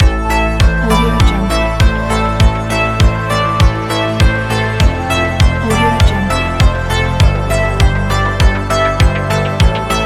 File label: synth wave